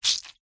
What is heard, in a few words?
horror gore fresh fleshy guts bloody squeeze gouging squelch